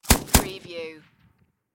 Machine Gun PKM

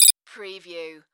Multimedia Beep

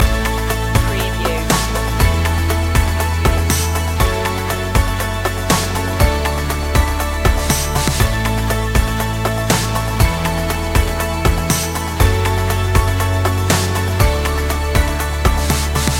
sunshine loop

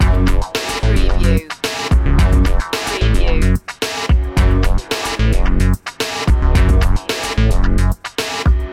80s cop funk loop

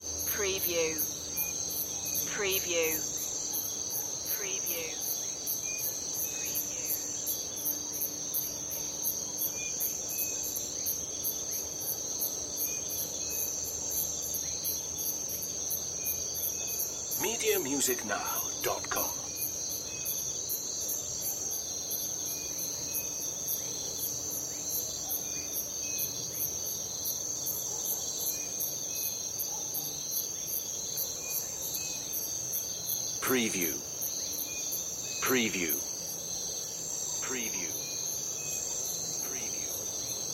night crickets and birds

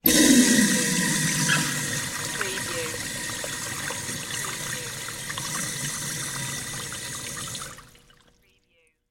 Urinal Flush Public

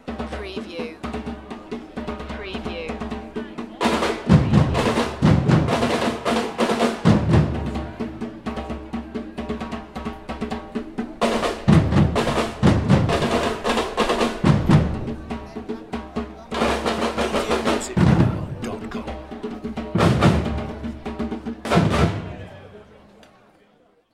Street Festival Samba Drums

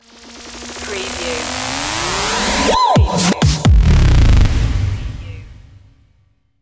what da pluck stinger

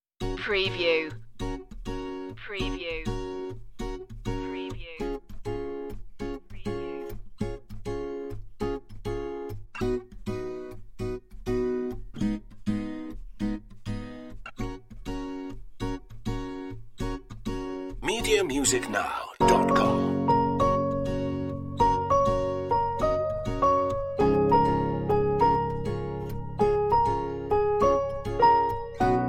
no perc

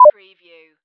pro beep

